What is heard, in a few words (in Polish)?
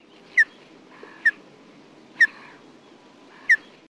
rybolow